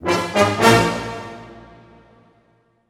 brass accent; Orchestral Loops

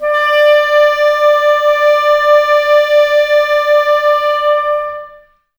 Clarinets, Wood Winds

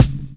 BD; Best Service; Dance Mega Drums

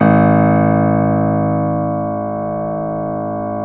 Keyboards Acoustic, G#0; USB Soundscan vol.17; AKAI

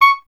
clarinet C#, Solo Instruments; Miroslav Vitous - Solo Instruments VOL-1; 90 s Sample CDs

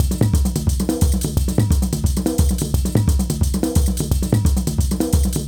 African Drum 75 bpm

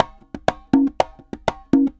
CONGAS, MERENGUE; Best Service ProSamples vol.14; AKAI

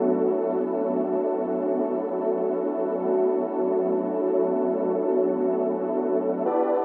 Moody Pad, Club Techno, 140 BPM; Zero-G; Construction Kits

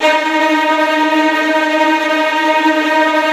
STR TREMOLO, Strings; D#1

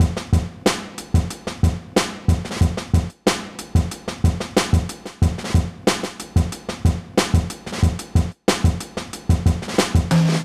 Raregroove Loop, Drum Loops Vintage; Zero-G Smoke

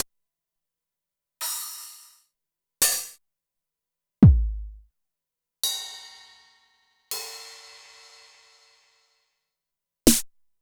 Zoom MRT-3B Kit

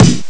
B drum; ST-XX Amiga Soundtracker Sample Packs

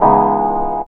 CHORD L, Drum & Bass 160 BPM; Ueberschall; Drum & Bass Flash Trackz

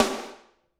SD, Drums; Bob Clearmountain Drums I

